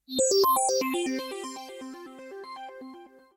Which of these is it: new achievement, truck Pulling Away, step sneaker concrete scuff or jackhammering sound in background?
new achievement